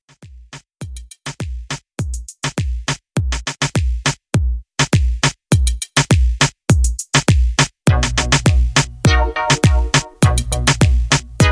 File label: Christmas Moombah Re-Drum